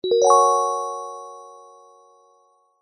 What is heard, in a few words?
multimedia alert bell chime event notification